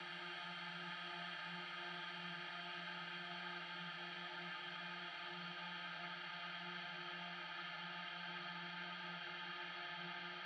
128 BPM F Min Ambience, House; Cymatics; Breakdown Loops; Sydney

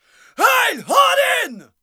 Battle Cry, Viking Vocal, Un-Processed

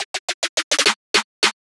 Riddim Drum Fill 140

